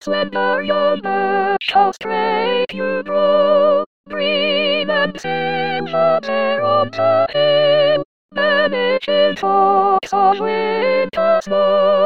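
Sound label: soprano; Dvorak; Songs of Nature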